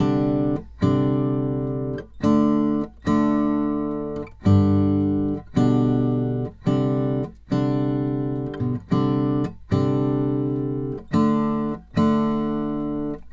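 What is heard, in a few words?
Country Guitar, Chorus 1; Digidesign; Pro Tools Essential Loops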